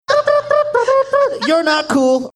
making mouth trumpet sounds singing you're not cool